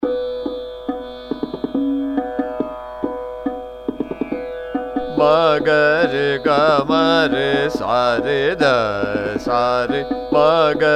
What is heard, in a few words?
Sargam Ma Ga Re Ma Ga Re Sa Ektaal Drut, Abhogi Kanada